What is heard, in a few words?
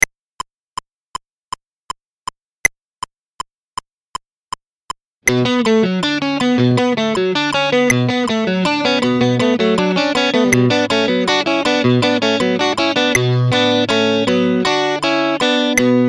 160 bpm, guitar; juego